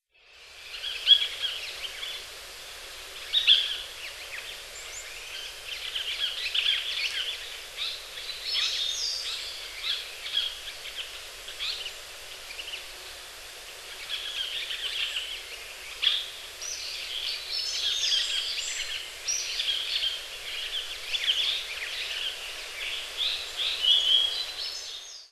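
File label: Japanese Paradise Flycatcher; hyogo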